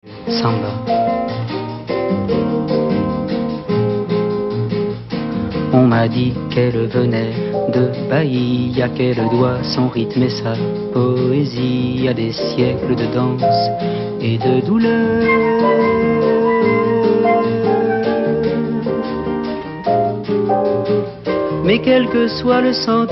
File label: Samba